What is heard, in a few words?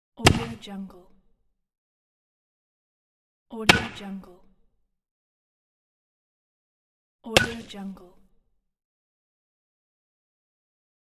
baseball bat hit sound; wistansound